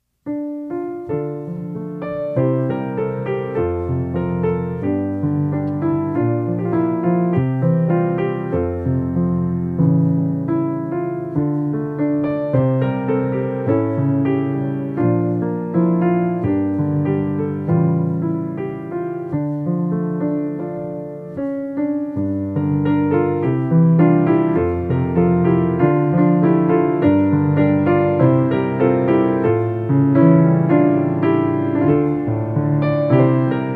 piano

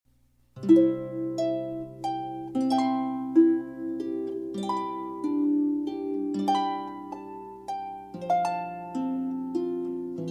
harp Morning Has Broken

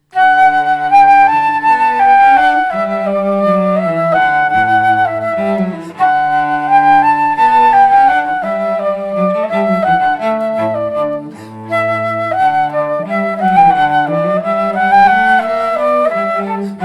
cello flute

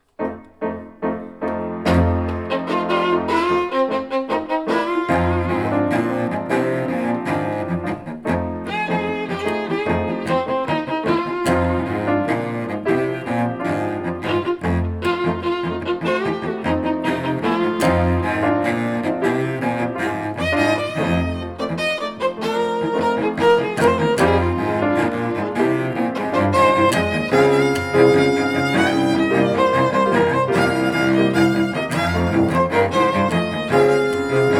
trio

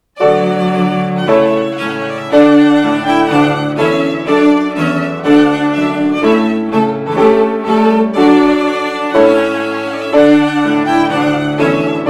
Trumpet Voluntary trio

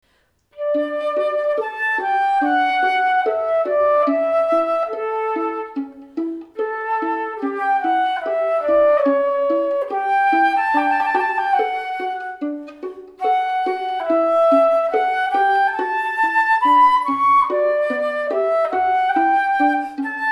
flute

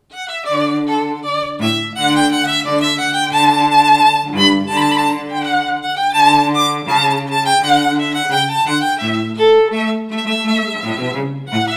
Rondeau string duo

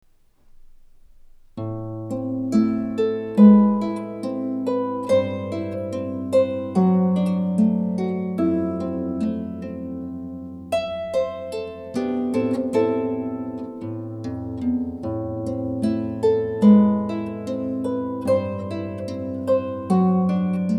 harp; solo

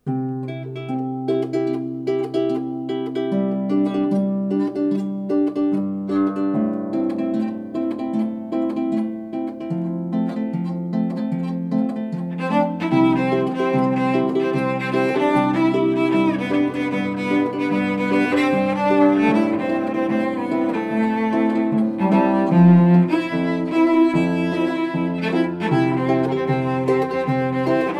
cello

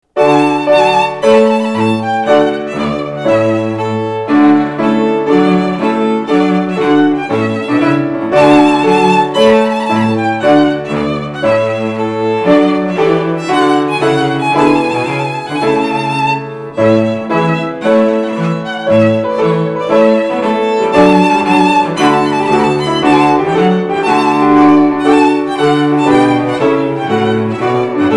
Trumpet Tune trio

